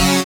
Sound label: SYN, dance; Sound & Vision - Gigapack II